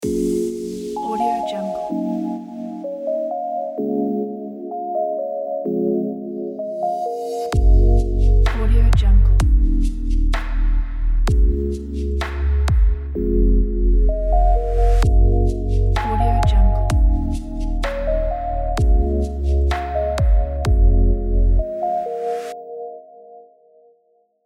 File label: deep house logo